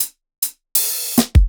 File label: fill 80bpm